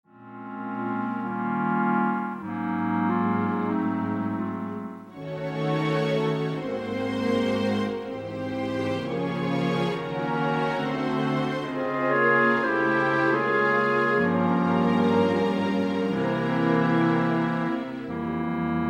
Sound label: blues; no no nanette